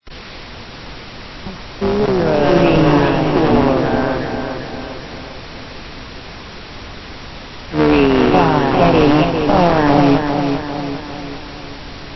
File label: portal; nyanland